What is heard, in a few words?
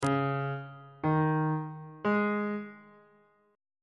c sharp suspended 2nd triad chord intervals on bass clef